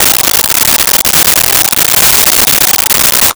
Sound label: Brush Teeth, Bathroom; 1001 Sound Effects